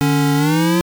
8bit

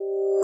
musicbox

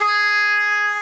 "faaa" G#5; 音MAD; 珈百璃的堕落

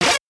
synth

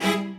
strings

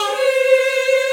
choir

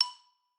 xylo

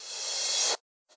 crash

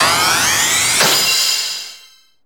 SE GAME SLIDE; maimai

